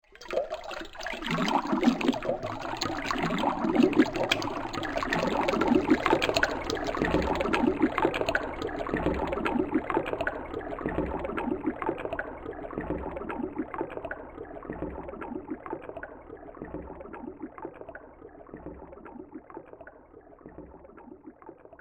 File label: Fantasy Water Sounds; Water Sound Effects